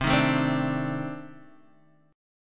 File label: C Minor 6th Add 9th C Guitar Open D